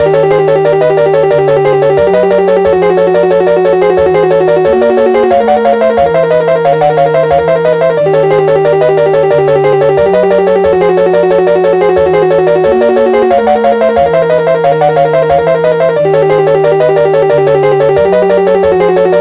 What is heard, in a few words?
8-bit Theme; super mario strikers gamecube; Bowser